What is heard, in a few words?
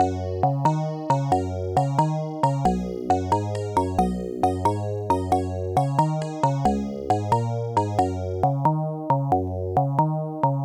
western